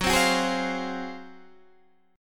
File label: Ab 13th Gb